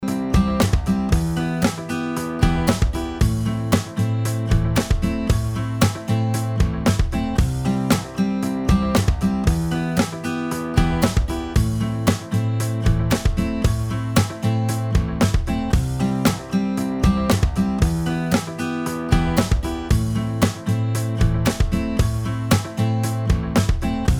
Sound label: strumming along